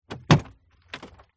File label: car door open volvo 850